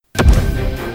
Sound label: menu woosh; national hockey league 2019